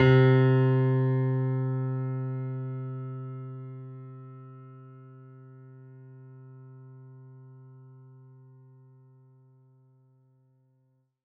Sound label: mini grand; bananenkeyboard